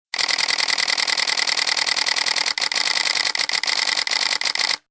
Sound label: Canon EOS 1D Mark III 10fps Burst